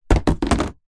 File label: tub plastic lid set down on tub; foley